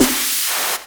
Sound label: SD HOU, Dance Mega Drums; Best Service